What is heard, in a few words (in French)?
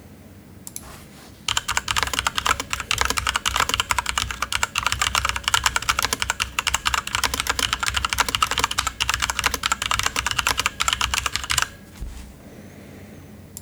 test bruit; asus rog falchion